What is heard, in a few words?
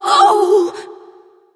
Wound Crit; Creature; Oz